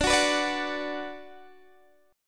D 6th D down Guitar Open D, chord

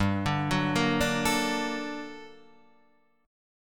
G Minor Major 9th, guitar chord

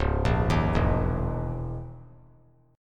Db Minor 6th Add 9th Db down; Dbm6add9 arpeggiated; JGuitar

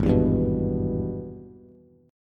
Minor Major 7th Sharp 5th, Bass; G#mM7#5; strummed; 4,7,5,4

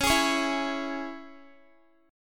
C sharp Minor down Guitar; C#m strummed

